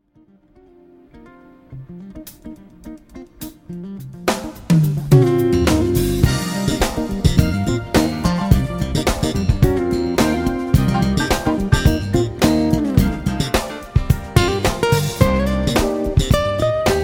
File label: Night Rhythms